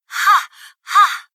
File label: sweating; monokuma